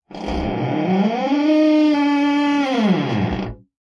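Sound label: door creak large, squeaks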